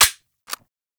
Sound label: Revolver Pistol Mech